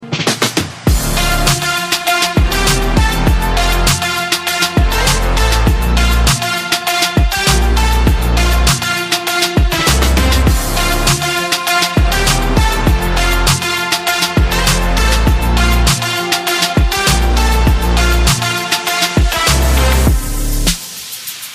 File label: INSTRUMENTAL RINGTONE